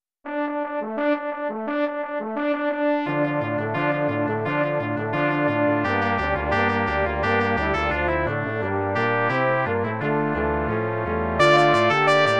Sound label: Brass Quintet